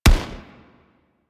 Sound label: Arch Long Rifle Shot, Weapons; Warframe; Archwing